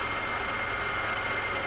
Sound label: Chain Gun Roll; Defiance